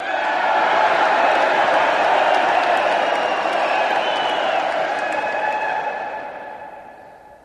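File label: Crowd Cheering Approval, big arena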